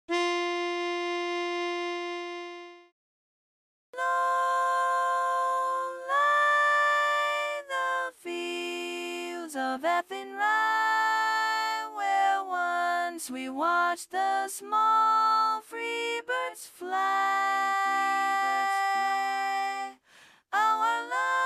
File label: Lead predominant; learning tracks